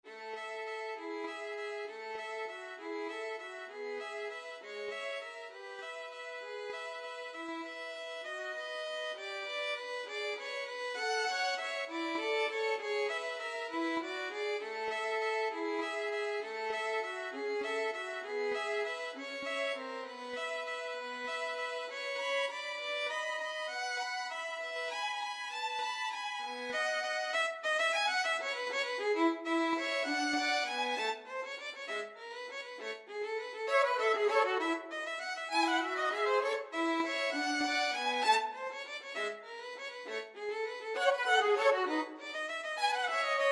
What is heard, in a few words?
tchaikovsky waltz, violin duet